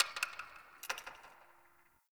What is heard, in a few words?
Ice Crack One Off; Warframe; Ambience; Nineteen Ninety Nine